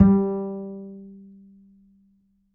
D string upright bass; Upright Acoustic Bass

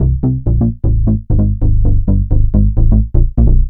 Bass 130C, uk garage 130bpm; Zona

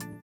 VIBRA; Spectrasonics; Metamorphosis

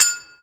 Percussion, House; Best Service; House Definition VOL-1; Drums

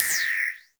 Crash Electro, Korg Radias

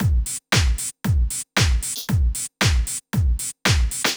Drumloop 115bpm, Nu Disco; Zero-G

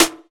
drum snare one shot

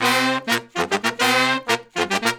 Horn Section; Sonic Foundry (Sony Creative Software); Jazz Solos and Sections

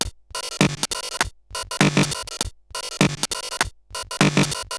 Bent RX17 (100BPM), cyborg beats; musicradar

